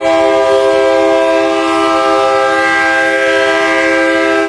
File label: Nathan K3HA Long, Trains Horns; PFx Brick